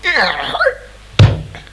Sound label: wolf; Little Red Riding Hood